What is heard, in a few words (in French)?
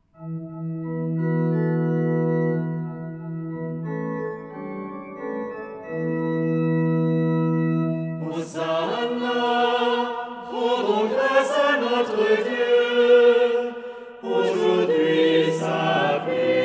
Hosanna polyphonie; rendons grâce à notre Dieu